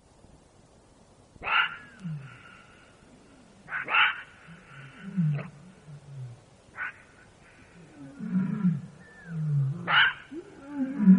zvuki shakala

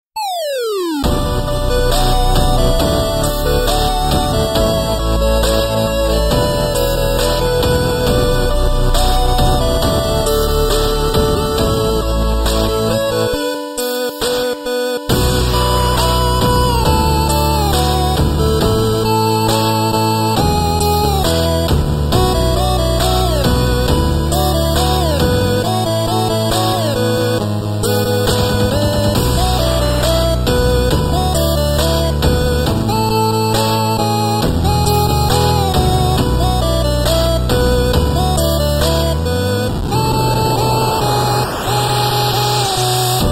8-bit muzyka na sotovyi telefon ringtony

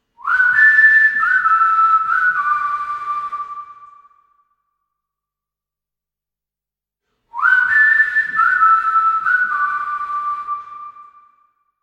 originalnye ringtony i uvedomleniia nokia asha 302

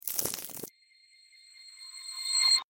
zvuki volshebnoi fei

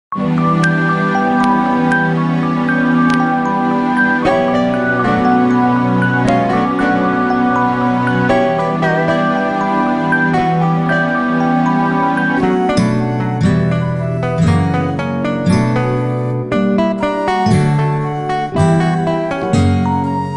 muzyka bez slov iz sovetskikh filmov

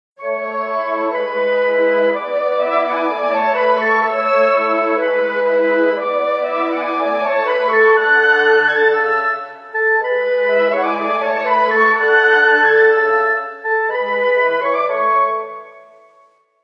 originalnye ringtony Nokia 5310 XpressMusic

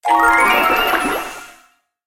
zvuki volshebnoi fei

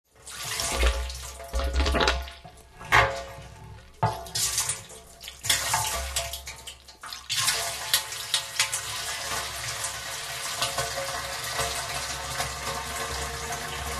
zvuki kanistry

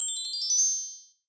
zvuki volshebnoi fei

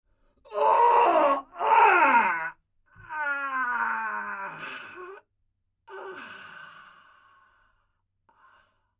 zvuki smerti i boli

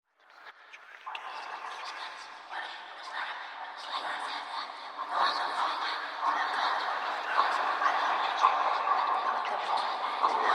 zvuki strashnykh golosov

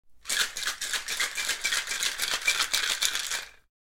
zvuki barnogo sheikera

